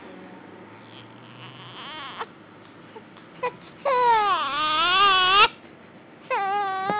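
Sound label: baby hungry, female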